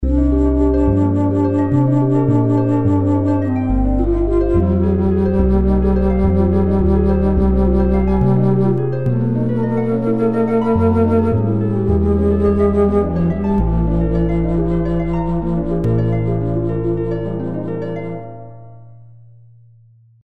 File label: Trumpet Song